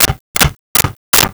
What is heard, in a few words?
Nail Hammer into Wood; Tools; 1001 Sound Effects